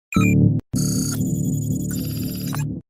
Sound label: High tech - Sound effect